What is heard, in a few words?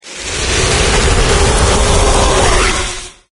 burn; slender; hellknight